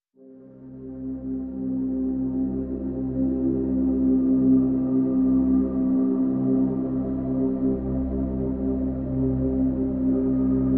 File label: Pads C